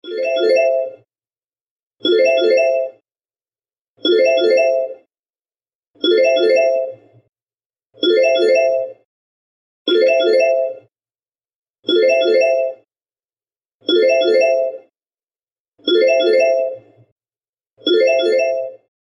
facebook video call ringtone

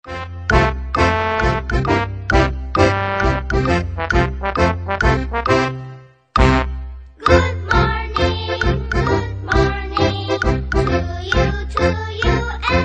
good morning ringtone

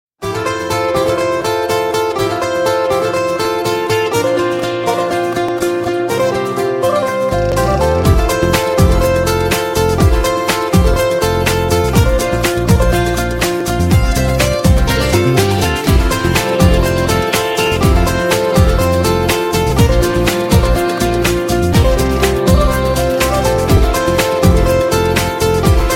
best chinese tone ringtone

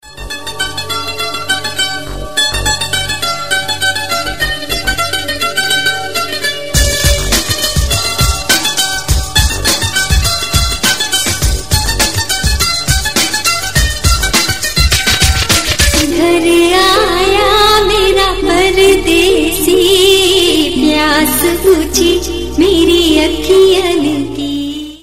simple ringtone

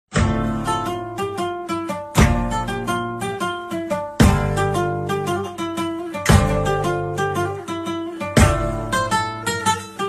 guitar famous ringtone; android

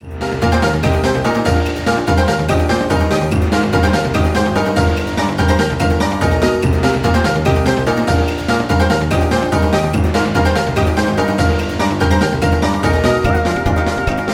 petal dance ringtone

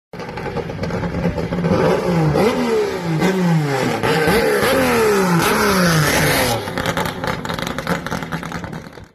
ford motor ringtone; android; varillero

